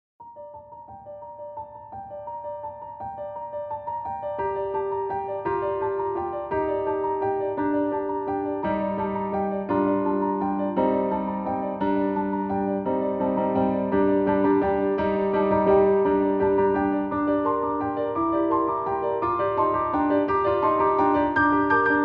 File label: Carol of the Bells (Piano Version)